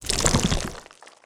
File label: worm standup, creatures